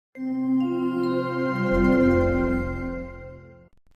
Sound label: Microsoft Windows 98 Shutdown Sound